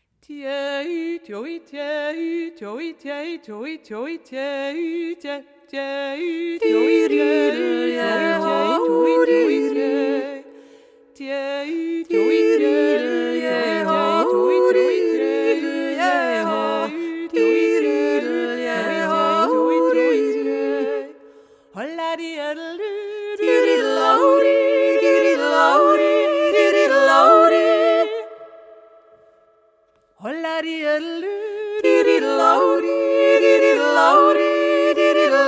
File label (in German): pliss e jodler